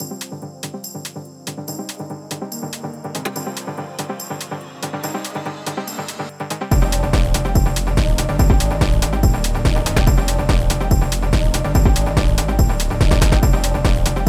Jazzy Resonance Phonk

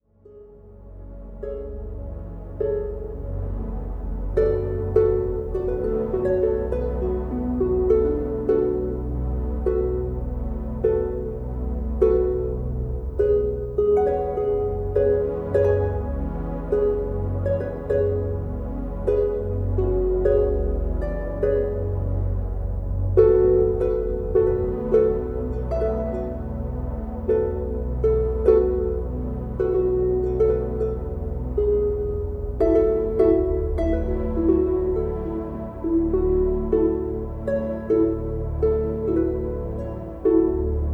Celtic Harp

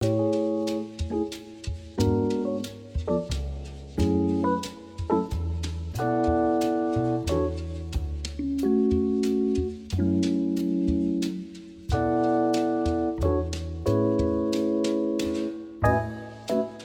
Chillout Background Jazz, Laidback Electric Piano

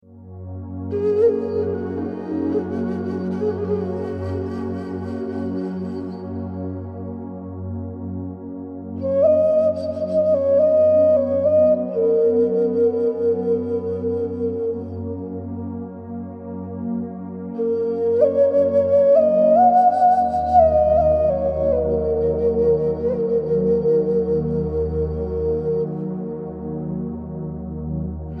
Jazz Music Therapy For Cats

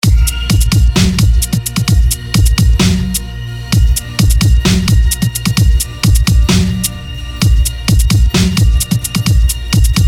DUBSTEP remix

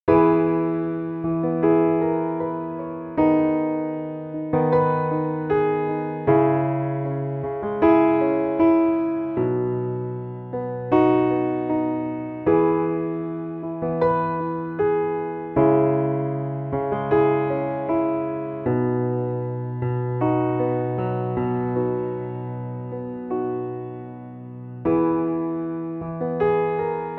Piano

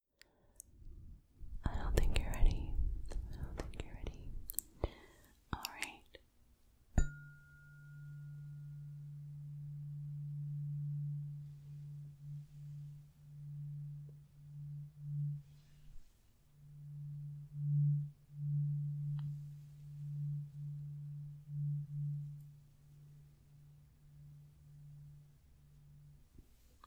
Asmr with Rubber Gloves

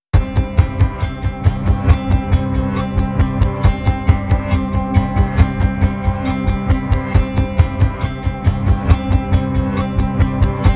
Sliding Guitars

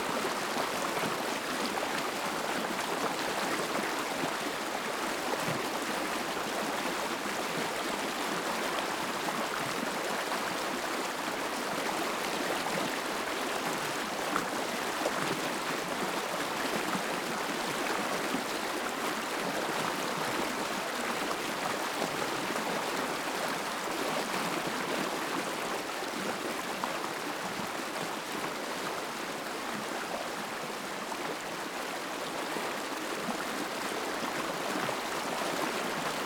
Large River Forest; Rainforest Sounds; Rain Sounds Collection

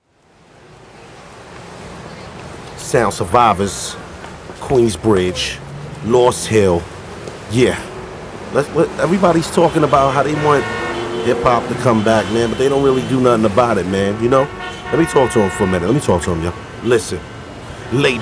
Acapella

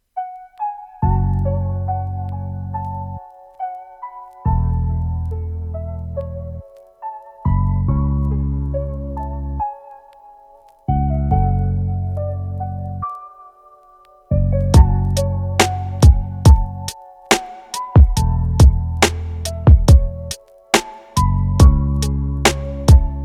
Lofi Beat

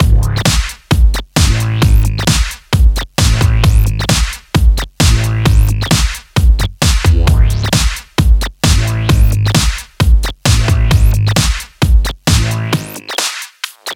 House Music 90 s

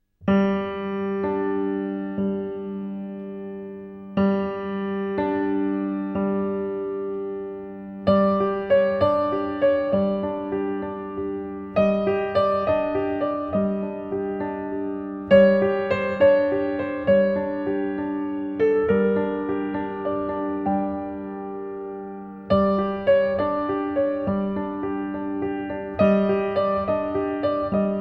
Piano

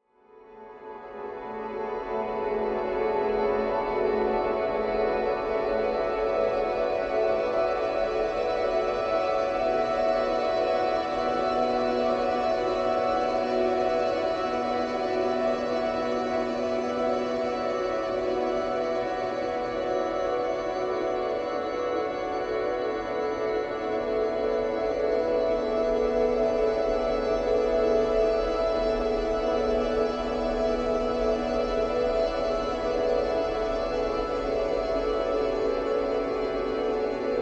Relaxing Music Sleep

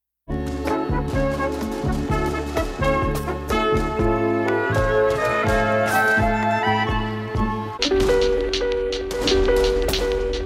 Instrumental

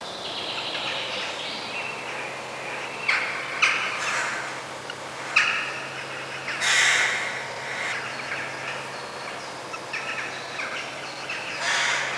Rain Birds - Sleepy Birdsong

